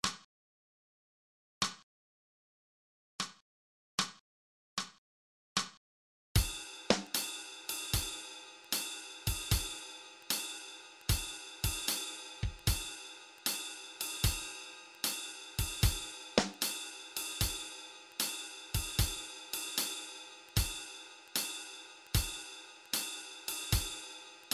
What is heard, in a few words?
Drum Track, Jazz Trombone